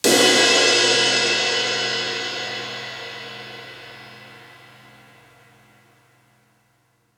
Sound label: CRASHES; UNDERBELLY